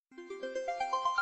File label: Cute Msg